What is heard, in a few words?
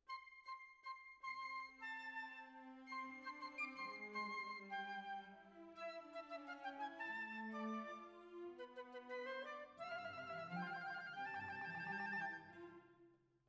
Clarinet